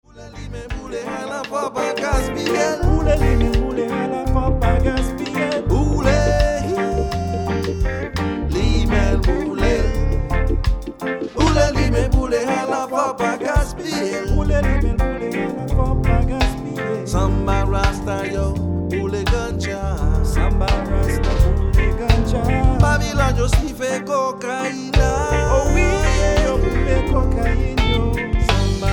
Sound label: Reggae